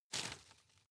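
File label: grab short medium, forest ranger; berufe; foerster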